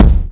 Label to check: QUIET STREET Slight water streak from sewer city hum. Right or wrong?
Wrong